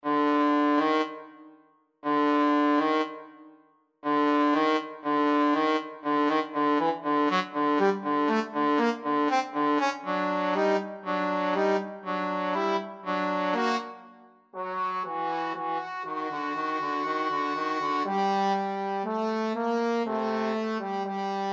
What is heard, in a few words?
new trombone duet